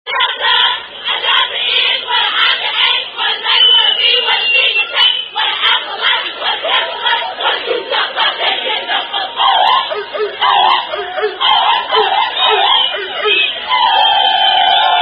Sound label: Delta Sigma Theta Chant